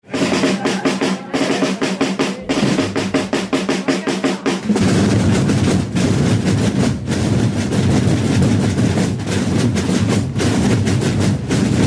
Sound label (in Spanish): Toque tambor Tamborrada